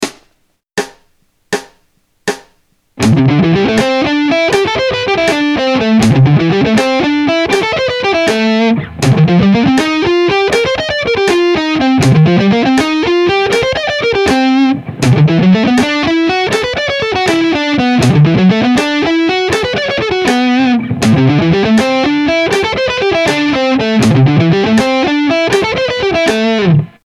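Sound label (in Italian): Sestine Terzine pentatonica, rock guitar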